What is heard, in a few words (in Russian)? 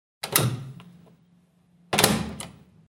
dverca holodilnika